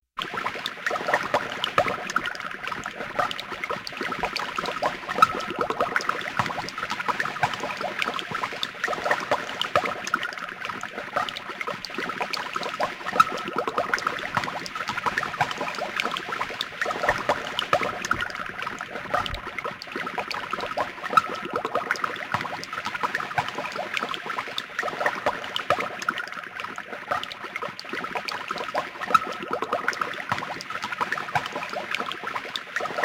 Witches Brew, Halloween Sound Effects; Halloween Havoc; Ktel

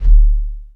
Menu Low Tone; Menu FX

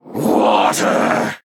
Principal Water; AudioWizards

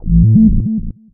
Pickup Scifi Energy; SFX Kit

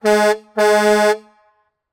Semi Truck Horn; Blastwave; Large Vehicles

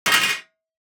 DICE on Metal, Throw and Roll, Metal, Two Dice; Samples; ArticulatedSounds; DICE Sfx Party (Extended)

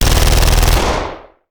Machine Gun; Sound Ideas; Guns

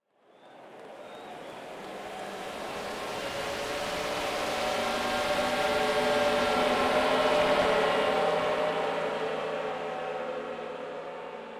Crowd Ambience; Pitch Black Audio Pong

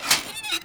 Door Small Metal Flap Open

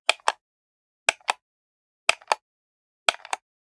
Generic Button Plastic Medium

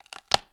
SNAP Clean; mono; Universal Sound FX; BREAKS & SNAPS

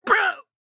town human road hit; Blind Drive

